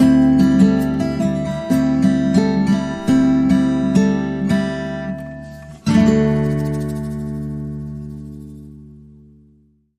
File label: Autumn Sting